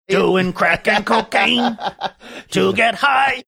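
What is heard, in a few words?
singing doing crack and cocaine together high crime dog; mcgruff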